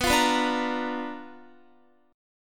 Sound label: B Minor Major 7th Flat 5th Guitar; BmM7b5 strummed